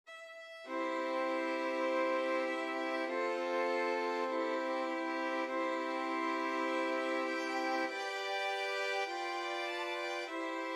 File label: swing low violin; violin quartet